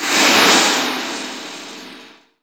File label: ELECTRIC; AMG - Vince Clarke Lucky Bastard